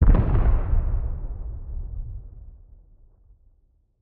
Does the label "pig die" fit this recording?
No